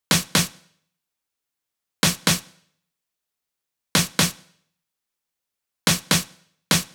Big EDM Drum Loop Snare loop, 125 BPM; Future House; Future House Tools

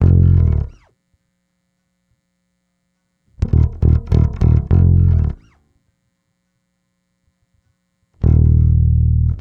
Bass, 102 Fmin; Big Fish Audio

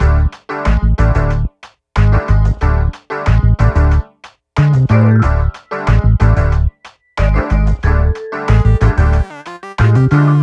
Combo, Hip Hop, 092, Dmin

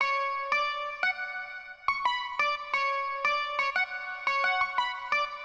Baglamas

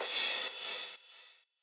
Crash loop, EDM 125 bpm; Future House; Drop; Ableton Projects; Big EDM 125 bpm (Drop) Project

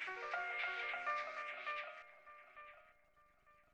Stab; Thirty-One Flavors

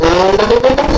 Hardware, Foley Wavetables; Cymatics; Foley Wavetables Vol 1; Serum

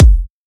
EDM Future Kick; Big EDM; Future House Tools; Kicks

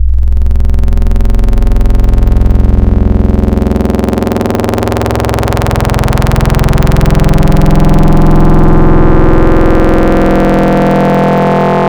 Sinc Sine, Wavetables; Cymatics; Wavetables Collection Vol 3; Serum

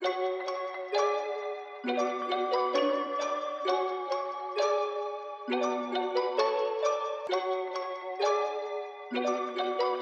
132 BPM F# Min Bells; Cymatics; Destiny Gold Expansion; Afterlife

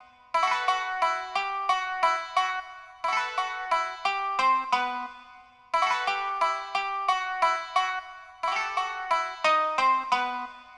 Baglamas, 089 Bmin, Hip Hop Exotica

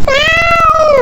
Cat, Wavetables; Cymatics; Serum; Animals for Serum - Gold Edition

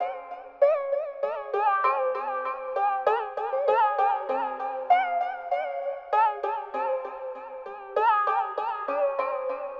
Koto; 098 Cmin; Hip Hop Exotica; Big Fish Audio

